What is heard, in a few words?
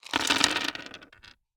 DICE on Hard Wood, Throw and Roll, Standard, 16 Sixteen Dice; Samples; ArticulatedSounds; DICE Sfx Party (Extended)